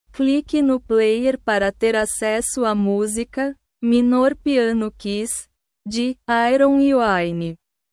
minor piano keys

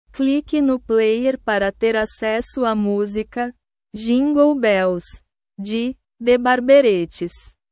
jingle bells

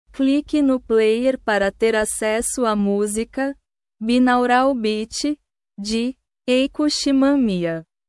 binaural beat

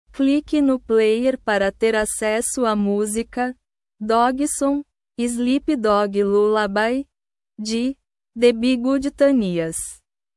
sleep dog lullaby

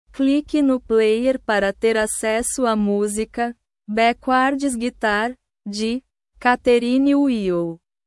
backwards guitar

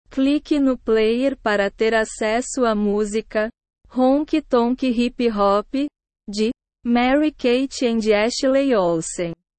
honky tonk hip hop

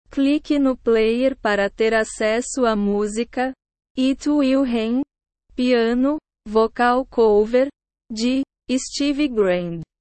piano vocal cover

